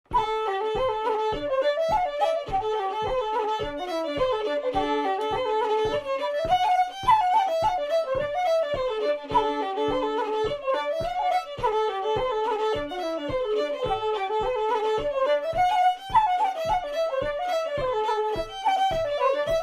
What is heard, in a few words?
Flute Fiddle; Old Bush